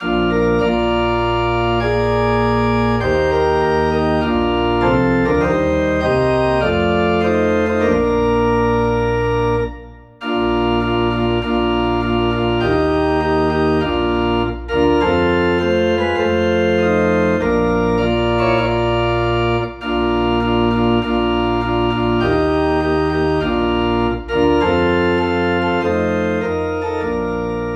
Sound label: Organ in B Flat